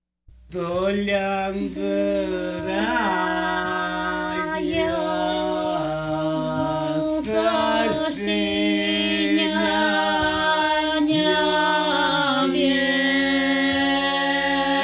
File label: Albanian Chorus; calabria; Whete Nde Magli